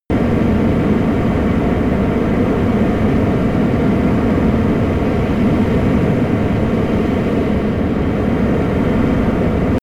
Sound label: plane boeing 737 turbine steady distant; flugzeuge verkehrsflugzeuge; verkehr transport